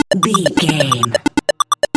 retro synth beeps groove

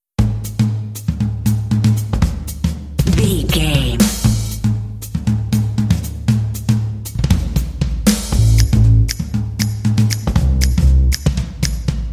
jazzy beat hipster cat

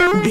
cartoon boing retro jump

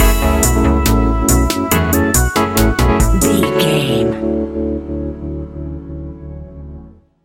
A relaxed and chilling piece of music; A relaxed and chilling piece of music, great for light documentaries, background music for tv shows, lift

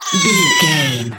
goblin fairy death

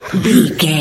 voice female growl grunt

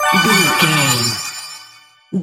magic general item collect

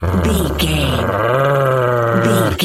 dog growl

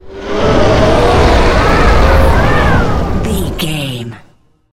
wind blizzard storm spell power

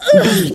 voice female hurt pain

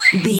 voice fun creature small mutant voice emotes

